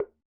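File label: Bongo Hi Slap, Roland BK-5; Percussion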